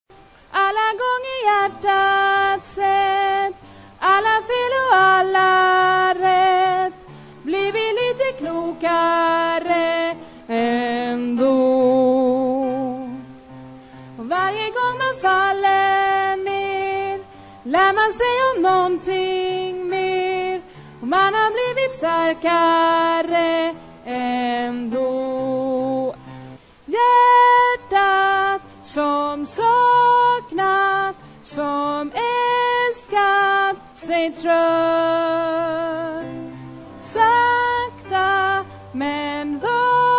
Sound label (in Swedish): bas